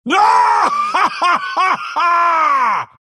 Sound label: laugh; dota; lycan